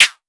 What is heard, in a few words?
Tempest Analog Snare